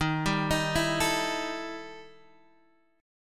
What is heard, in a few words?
E Minor Major 11th Eb, guitar